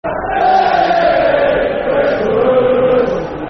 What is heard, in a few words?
Nananana, Football Chant; Nananana Bristol Rovers; Bristol Rovers Brfc